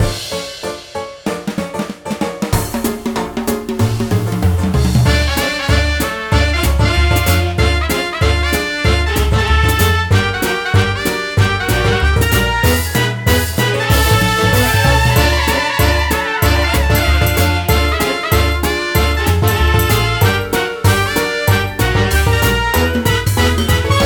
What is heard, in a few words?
music; Adventure Land